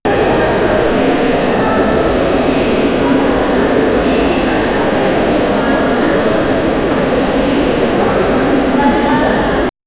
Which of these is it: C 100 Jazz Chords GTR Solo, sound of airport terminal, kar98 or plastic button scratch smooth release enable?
sound of airport terminal